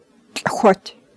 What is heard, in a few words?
beat